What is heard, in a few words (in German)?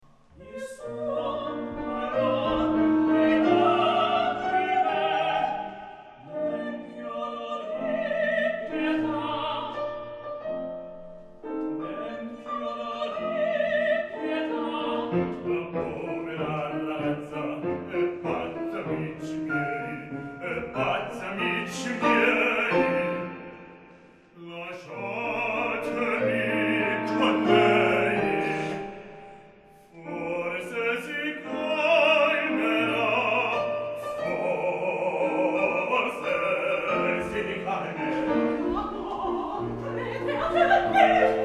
Quartett; Don Giovanni Quartett (Mozart); Meisterkurs